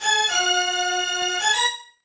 Beat

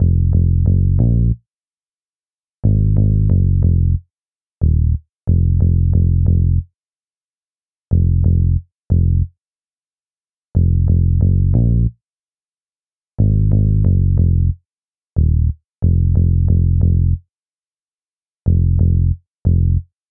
highway stage bass

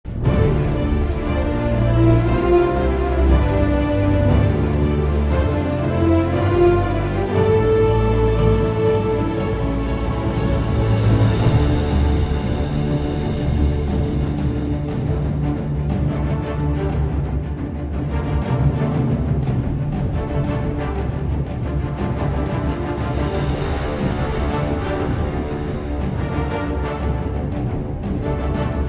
Round Start; Paladins Original Soundtrack 2016

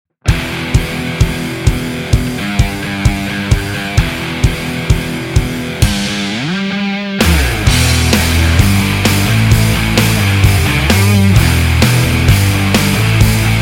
Hard Rock

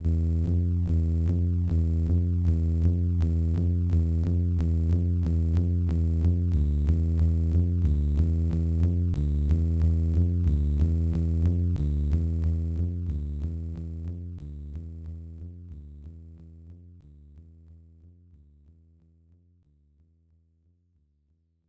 Shark Approaching; King's Quest III To Heir is Human; Apple IIGS

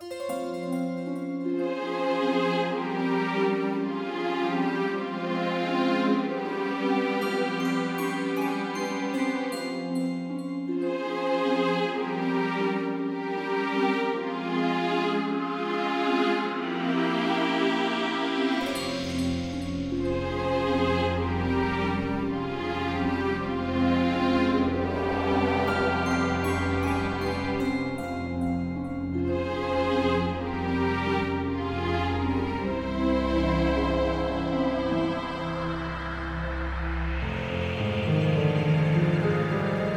Forest World Exploration; sky break

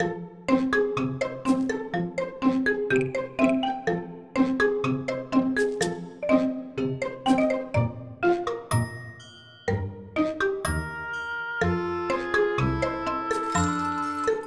background loop; IQ Test Wii U gamerip 2014

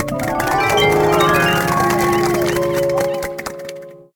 SUCCESS CHEERS Win Claps Cute Vocal Chime; Trolls

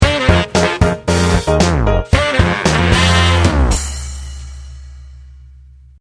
Result Failed; Streetboy Run to the Beat

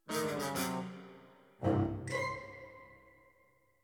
music sting skillshot missed; My Little Pony

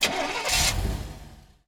vehicle pickup ignition

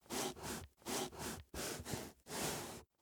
VENT WALKING

